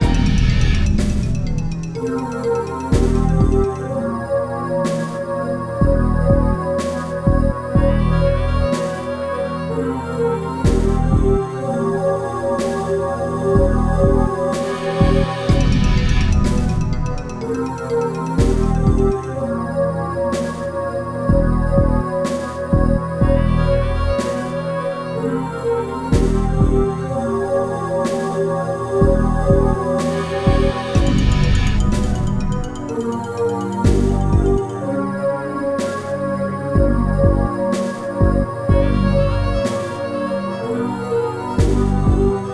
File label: battle; Sword of Moonlight; KING'S FIELD